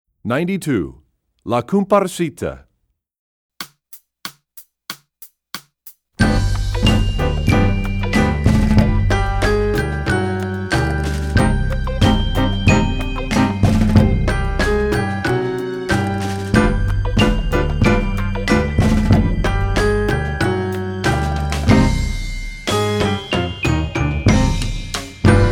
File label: Percussion; La Cumparsita; EE2000 Book2